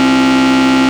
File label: Ultimate Bass Root C; Vengeance Samples